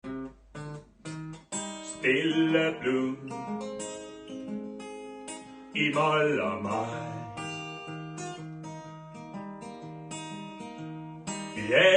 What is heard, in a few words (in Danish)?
Pianomand